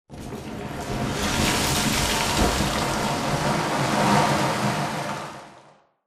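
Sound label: Explosion Rumble Interior Distant Debris; Boomtown; Titanfall 2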